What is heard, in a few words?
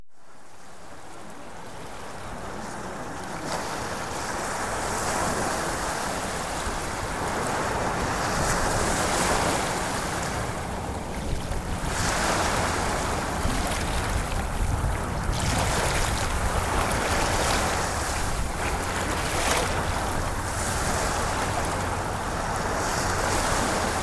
Relaxation Ocean Waves; Premium Subliminals; Emotional Mastery